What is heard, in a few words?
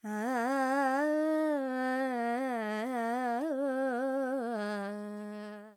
Charukesi, Vocals, Carnatic Female, Alaps, G, Dry